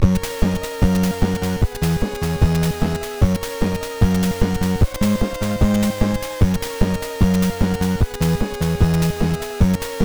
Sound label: Express Train; Esper Dream 2; nes